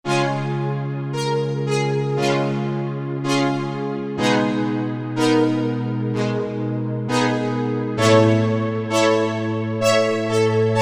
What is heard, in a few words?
Mellow, Synth; Synth 3